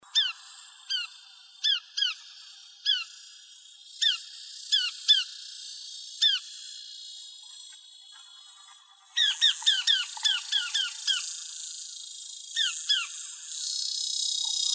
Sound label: CALLS, Emberizids; deviche